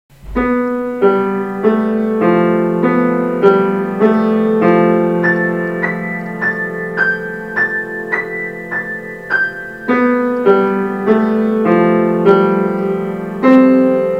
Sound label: variations on bells